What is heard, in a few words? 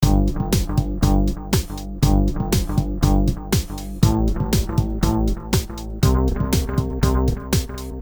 depressing rock music